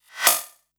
Glitch, noise

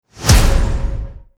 Cinematic, transition

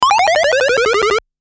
Game, transition

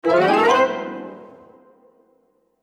Cartoon, transition

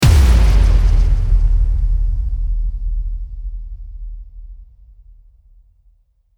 Impact, transition

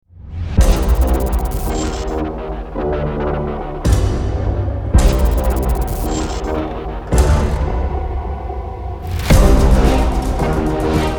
horror halloween trailer

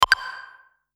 Notifications and Buttons

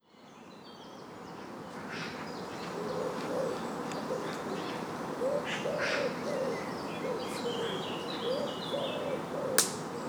snapping branch in jungle ambience

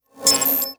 Glitch, noise

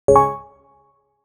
quick win video game notification; click

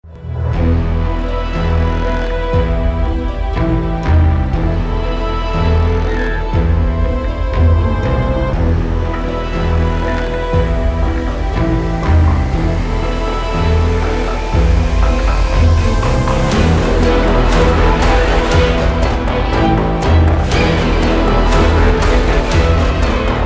scary trailer

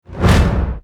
Cinematic, transition

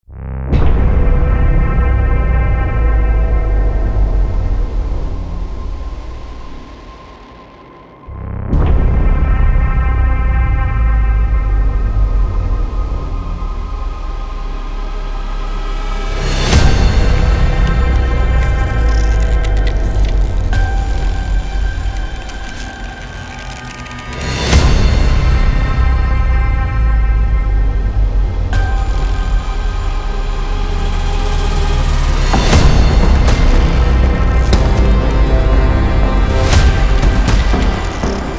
damaged rising trailer

